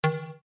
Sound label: sms plastic pipe